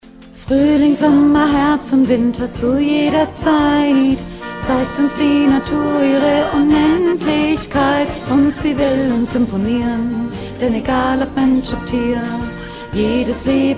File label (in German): Mittelstimme